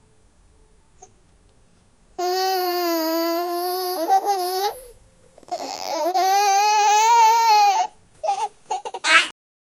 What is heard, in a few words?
baby smiling